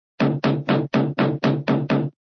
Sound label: knock on door; cartoon; fred's door; hannah-barbera